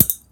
fence hit; hits

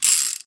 m1895 switch; weapons

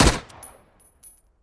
micro uzi fire; weapons

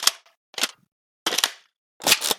vepr12 reload, weapons

